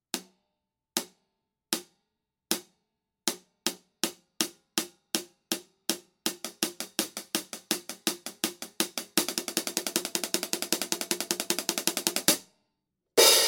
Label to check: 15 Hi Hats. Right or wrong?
Right